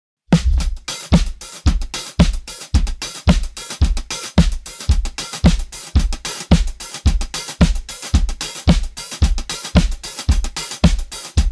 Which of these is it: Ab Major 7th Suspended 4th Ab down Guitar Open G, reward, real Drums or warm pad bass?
real Drums